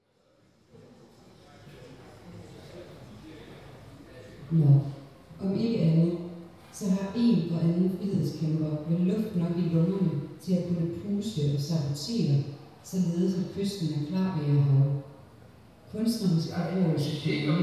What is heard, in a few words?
Binaural